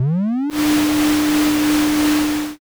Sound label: vacuum